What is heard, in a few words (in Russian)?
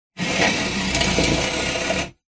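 zvuki barnogo stula, bar stool sounds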